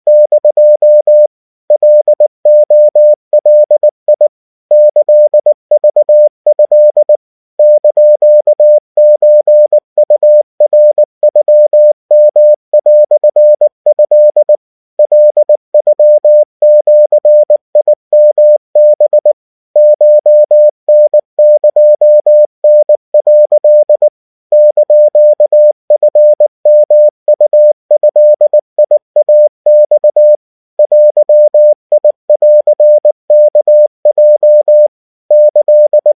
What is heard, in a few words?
Japanese 70cpm